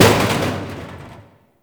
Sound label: Destruction Small Metal Hits; Ambience; Warframe; Corpus Railjack